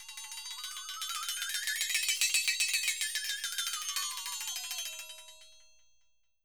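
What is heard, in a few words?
flextone, Roland MV8800 percussion; flxton2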